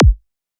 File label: Kick; LiLA'c Records PsyTrance Sounds 01